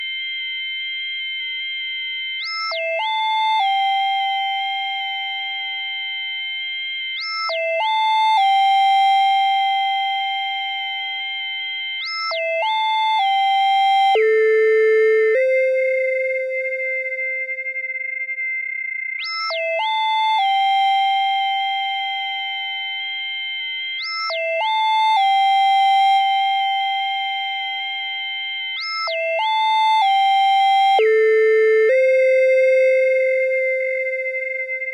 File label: riff